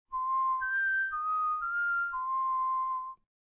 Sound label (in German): Pfiff Komplett